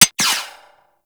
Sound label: Sniper Mech; Warframe; Tenno